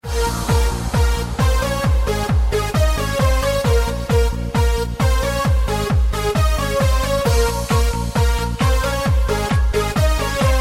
remix

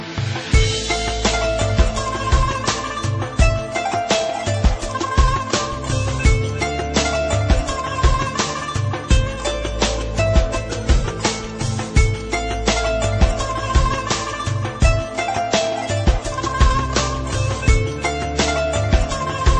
arabian chillout

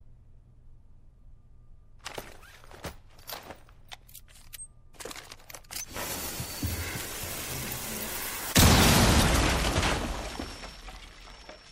thermite grenade

